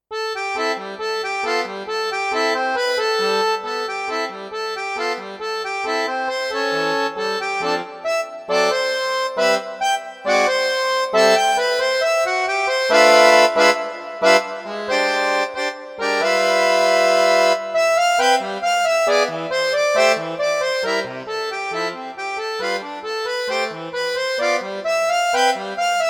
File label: Swing Time